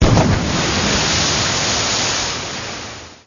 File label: splash